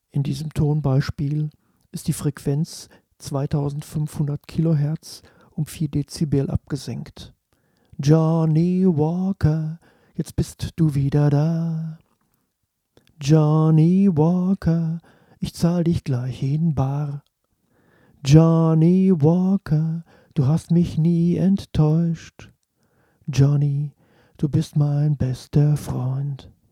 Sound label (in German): Akustik Duo; selbst abmischen